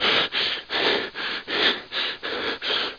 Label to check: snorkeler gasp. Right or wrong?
Right